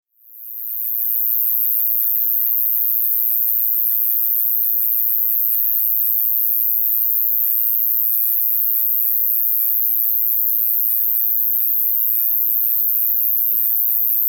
hime kusakiri

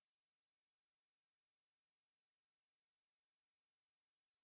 tsuyumushi

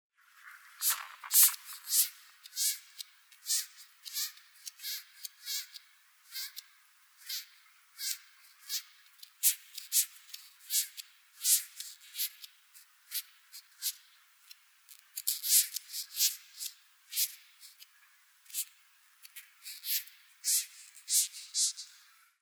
gomadara kamikiri

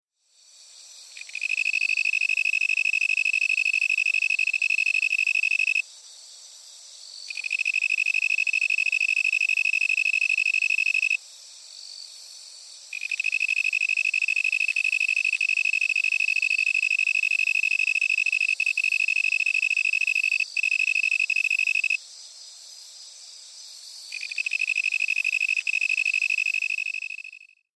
kogata kantan

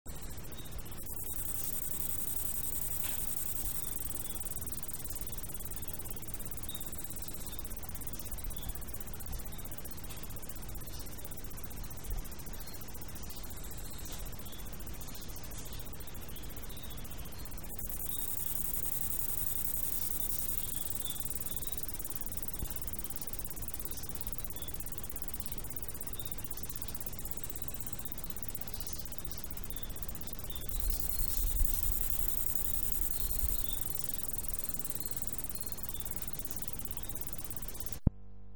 kasumi sasakiri

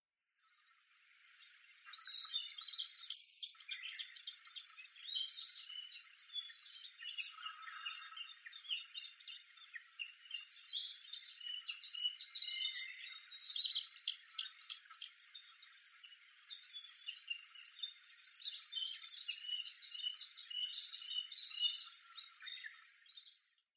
madara suzu

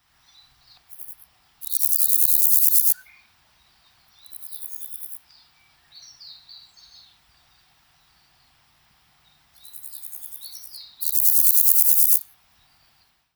hina batta

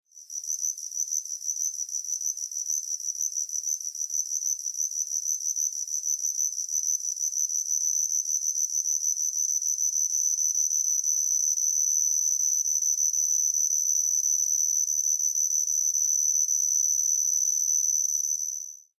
yamato hibari

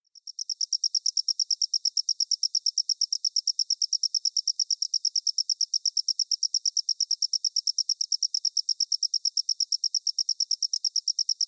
kurotsuya

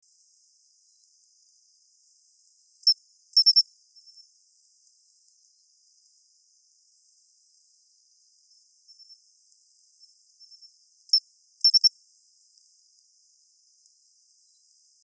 matsumushi

